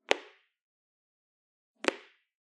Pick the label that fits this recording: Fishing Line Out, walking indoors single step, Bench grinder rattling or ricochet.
walking indoors single step